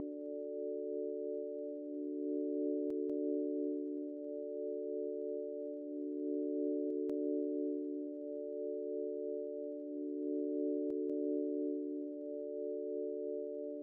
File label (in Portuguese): animais